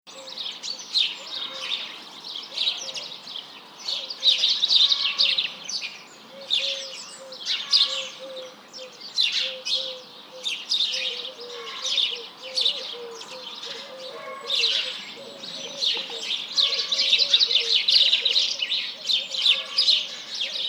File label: Birds sound effect; bigsoundbank